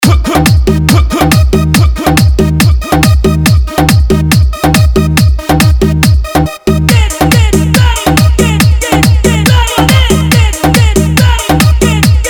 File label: Remix Song